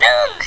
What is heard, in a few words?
boom; valve; elf